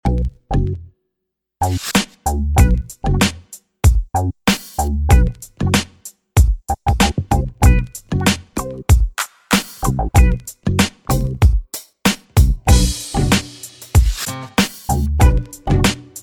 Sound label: beat 96; Radio Geretsried; Opener